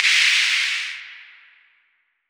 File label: Cymbal ONE SHOT